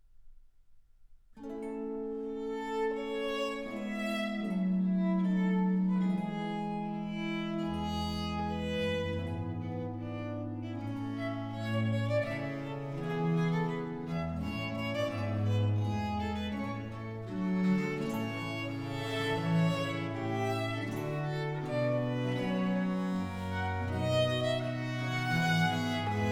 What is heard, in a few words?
Trio Sonata in B-Flat Major; Purcell & Blow